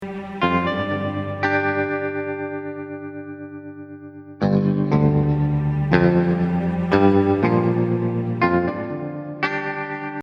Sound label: less powerful and energetic, music